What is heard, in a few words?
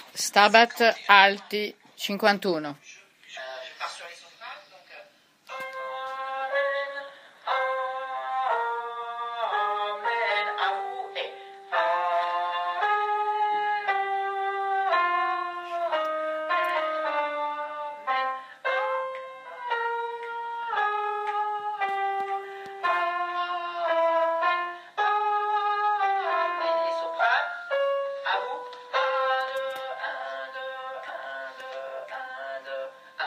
Stabat fugue alto; Final fugue complet